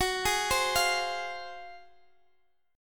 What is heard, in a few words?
F Minor F sharp, Fm/F# chord; arpeggiated; JGuitar